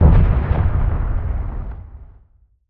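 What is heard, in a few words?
explosion short deep; weapons; starruler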